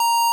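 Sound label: pico A#4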